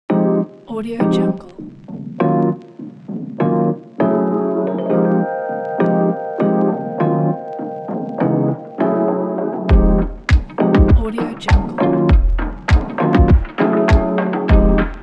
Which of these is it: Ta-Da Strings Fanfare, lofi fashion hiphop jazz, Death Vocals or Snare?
lofi fashion hiphop jazz